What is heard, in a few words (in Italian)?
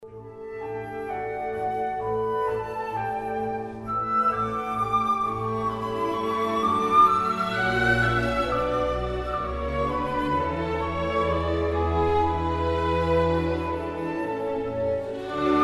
Andante con moto; Roma Tre